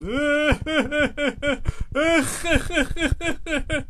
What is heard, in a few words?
laughs